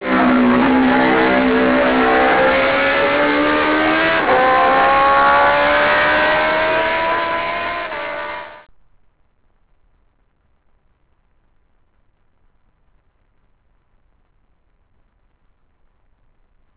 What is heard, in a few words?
MV Agusta 750